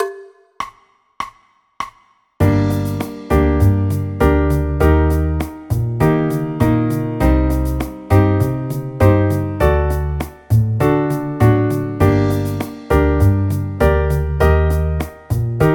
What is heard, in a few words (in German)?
g moll akkordverbindungen